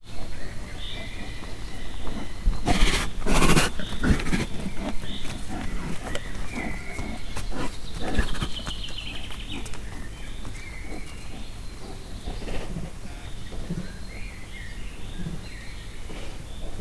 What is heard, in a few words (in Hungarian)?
kifutoban madarak, bengalitigris; miskolci zoo 2009